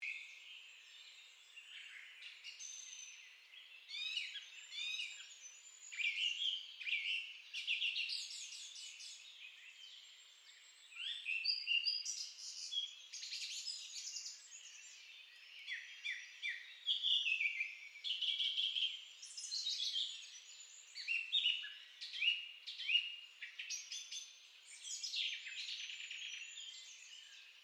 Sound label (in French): Grive musicienne